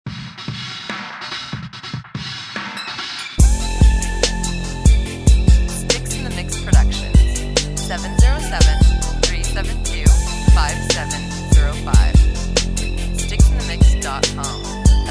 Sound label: chipmunk girl